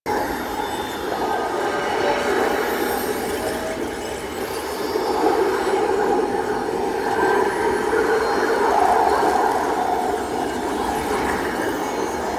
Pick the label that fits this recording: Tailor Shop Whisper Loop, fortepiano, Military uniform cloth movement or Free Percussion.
Tailor Shop Whisper Loop